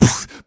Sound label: Beatbox Snare, Human Beatbox